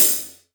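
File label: open hi hat, Real Drum Kit; Best Service ProSamples vol.43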